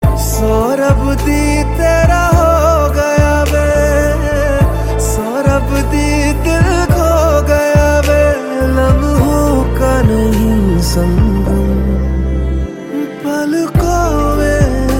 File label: romantic ringtone